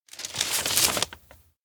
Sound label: paper paste layer; anemoi